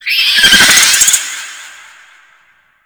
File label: Drone Charge Warning; Warframe; Infested